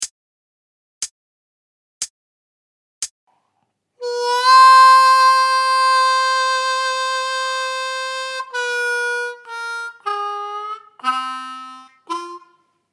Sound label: Lick Slow, harmonica